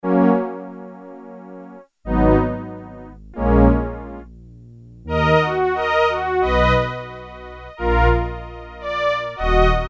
Sforzando Strings, Korg Poly-61M

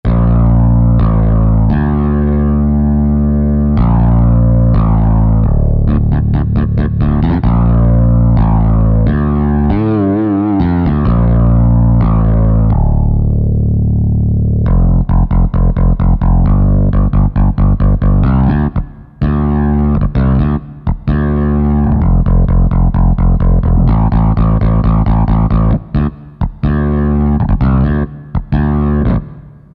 DRIVE PK BS., Roland SC-880; Factory Patches

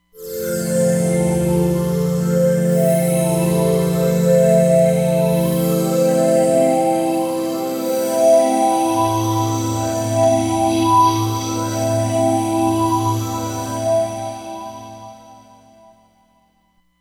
Phaze Singer, Roland Fantom XR

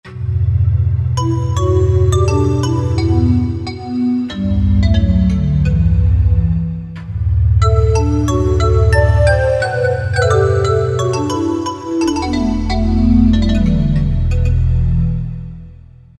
Bottle Bell, Korg M1; Factory preset examples

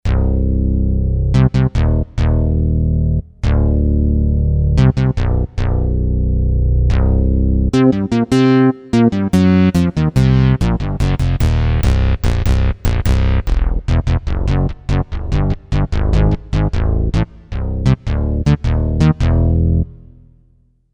PD Bass, Kawai K5000S

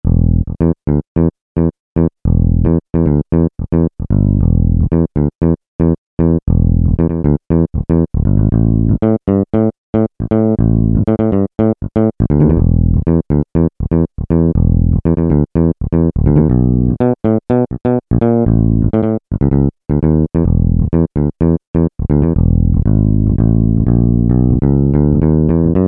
Finger Bass, Peavey DPM Spectrum Bass

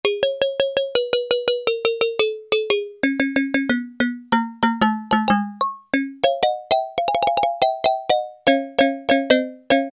COWBELL, Yamaha DX7

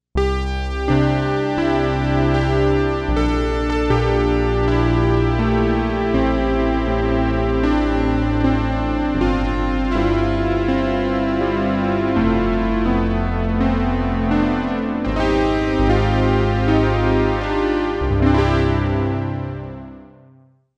Water Piano

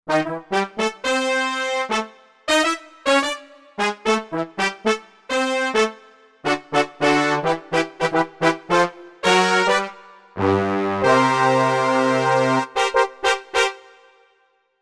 Brass Brassss

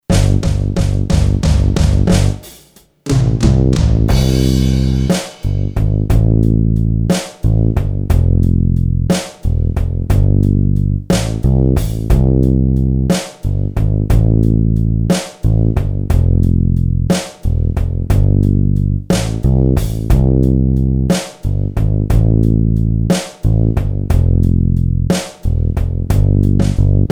BALLAD, Boss DR-3

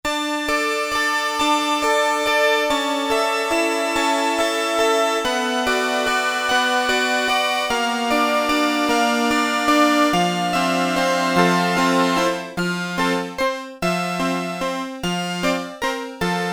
Synth Celesta, Casio MT-600; Tones

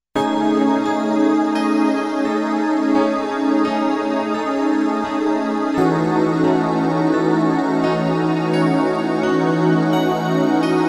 Chillin Piano Pad

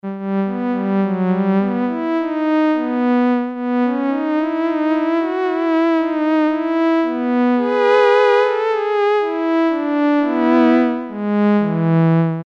Cello, Roland Juno-106; Factory Preset

